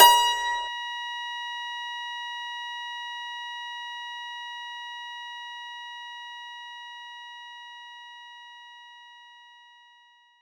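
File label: Dulcimer F#5; knights try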